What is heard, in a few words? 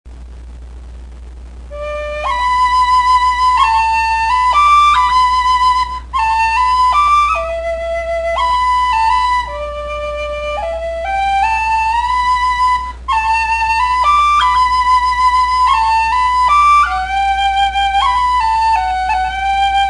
whistles; inisheer